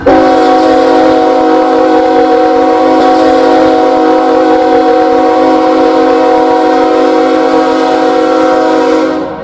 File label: horns; bnsf rl k5hl; run8 v3